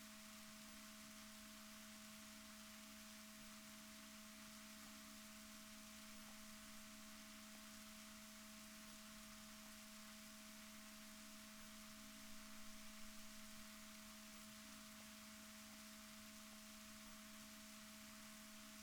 rorquals negatif, Balaenoptera Physalus Chile

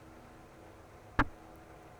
pulses, BALAENOPTERA; MONACO 2022-07

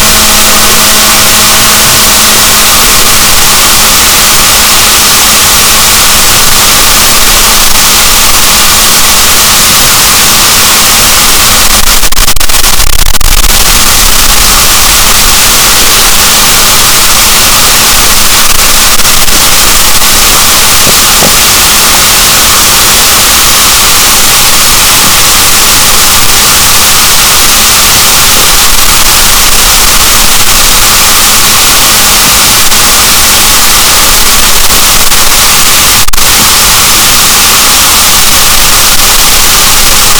rorquals negatif, boat

